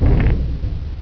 CRAWLER BUNCH

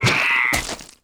VOLCANIC DEMOLIS WAR DIE

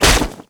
Axe cloth, Damage

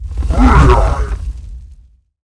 War Attack; Greatblock

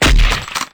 Gun; Weapon

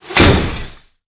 Arrow Metal, Damage

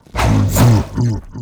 WAR ATTACK

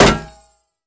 Rifle Metal, Damage

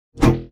beam axe; Weapon

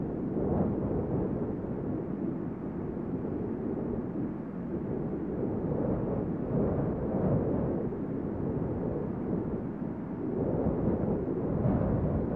environment, ambience

